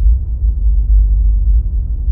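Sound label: space background; AKAI S6000 CD-ROM - Volume 6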